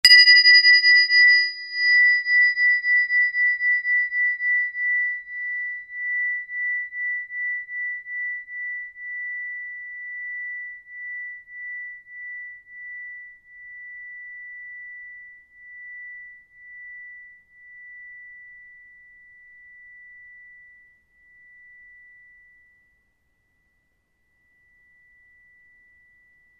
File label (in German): dreieck h3